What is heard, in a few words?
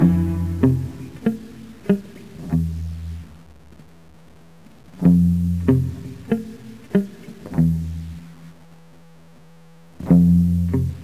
Self Destruct Engine Room Loop; 10 Second Ninja X; ps vita ps4 switch windows xbox one gamerip 2016